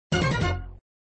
Sound Extra ball sound alert (usually x4), flipper; Extra ball sound alert (usually x4) v2